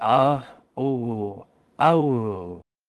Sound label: aaugh